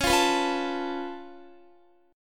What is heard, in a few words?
Db Augmented 7th Db down Guitar; strummed; JGuitar